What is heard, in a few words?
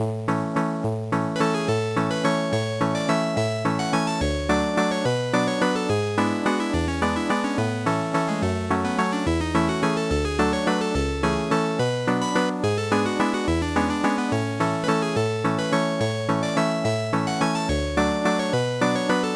Royal Waltz; Phantasy Star Collection; GBA